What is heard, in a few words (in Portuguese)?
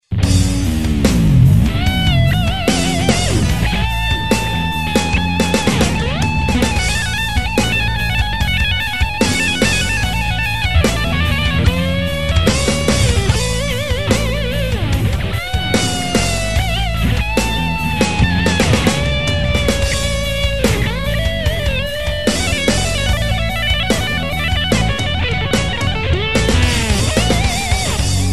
testando timbre